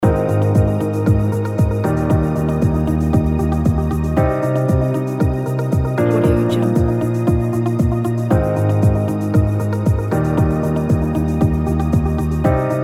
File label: pure corprate loop